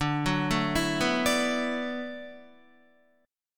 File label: D 6th Add 9th D, Guitar; D6add9 arpeggiated; 9,9,9,9,11,10